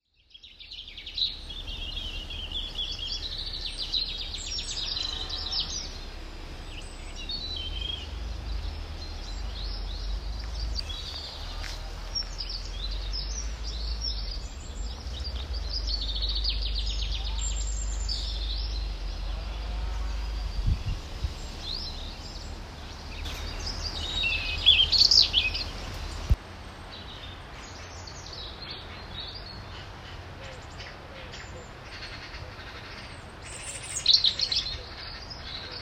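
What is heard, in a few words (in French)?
oiseaux île aux moines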